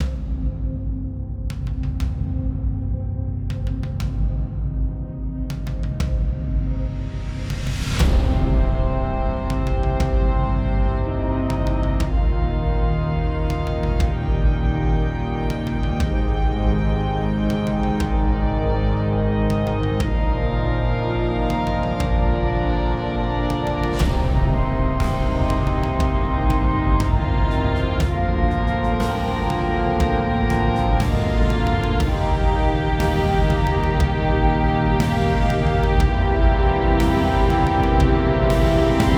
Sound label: cinematic